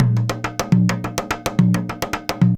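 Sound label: junjunba groove; Best Service; World Colours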